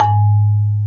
Bass Marimba

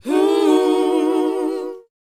WHOA C#, Vocal, R&B & Dance; ILIO; Vocal Planet

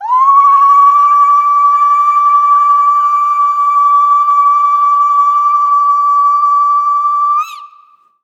BERBER CRY; Spectrasonics; Heart of Africa

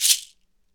SHEKE, Afro Shakers, Shekeres; Roland LCDP14 Africa VOL-2

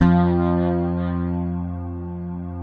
Fingered bass F2 R; Sampleheads; Will Lee Bass Library

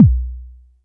Kick F2; EMU Orbit-3

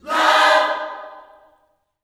LOVE CHORD, Gospel, Vocal; ILIO; Vocal Planet

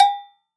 Agogo Lo; Roland SPD-S

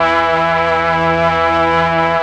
STR, M400; ILIO; Propeller Island Legendary

